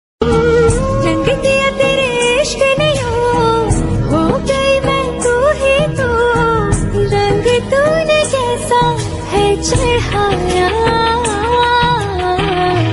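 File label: original soundtrack pakistani ringtone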